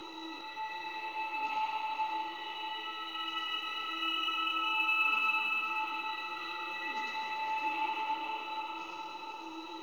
UI Login Reward Box Hover

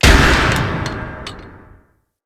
Grineer Fall, Foley, Impacts; Characters

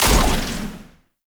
Spider Wire Impact Whoosh

